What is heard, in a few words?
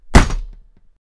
Thud; RimWorld Biotech; TraderShips